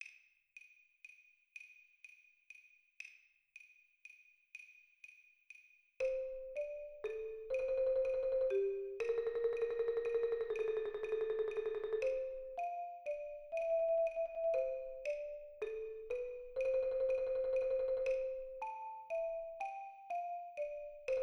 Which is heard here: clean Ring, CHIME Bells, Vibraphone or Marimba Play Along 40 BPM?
Marimba Play Along 40 BPM